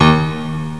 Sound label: Plink Bass; jazz jackrabbit 2; M8